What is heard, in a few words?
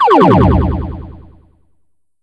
Laser; SSV-Music